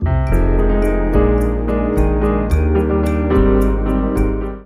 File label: Ballroom Cuties, Sting 2